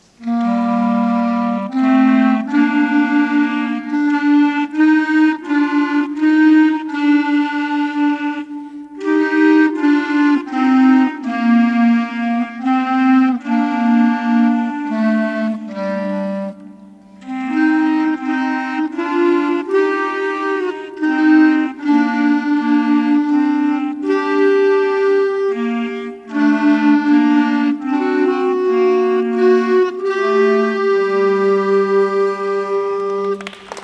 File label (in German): Altenglische Volksweise